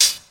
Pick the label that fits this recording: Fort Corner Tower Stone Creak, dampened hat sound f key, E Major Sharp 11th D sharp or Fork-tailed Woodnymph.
dampened hat sound f key